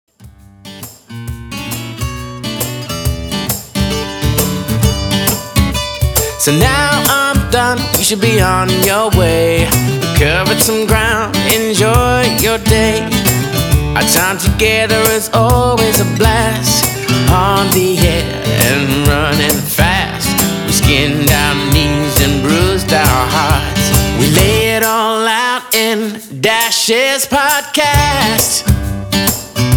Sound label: Podcast Close